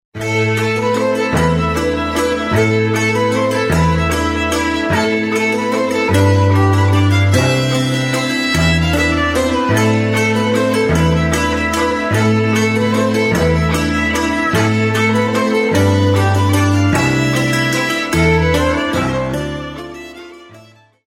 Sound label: waltz